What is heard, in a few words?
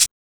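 SHAKER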